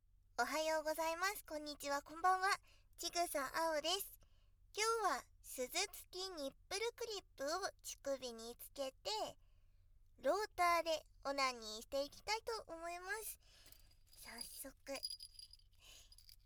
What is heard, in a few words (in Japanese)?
強力ローターで強制絶頂 鈴付き乳首クリップ バイノーラルマイク収録; 永久乳首攻め！